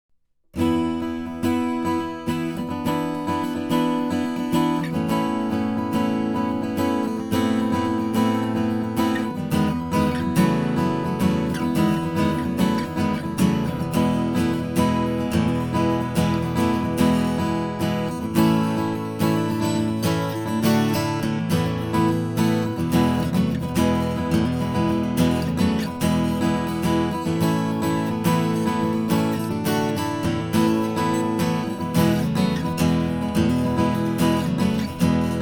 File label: strum, guitars